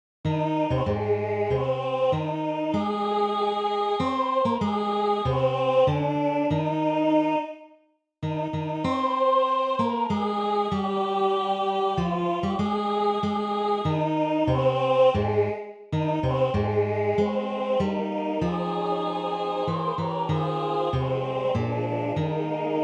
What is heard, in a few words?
National Anthem Bass